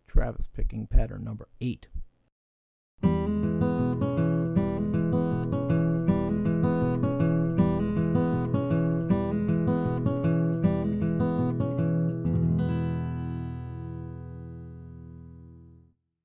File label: Travis Patterns; travis style lesson materials